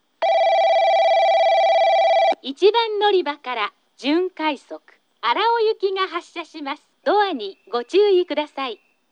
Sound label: kurosaki semirapid arao; 1番のりば発車放送（準快速 荒尾行き）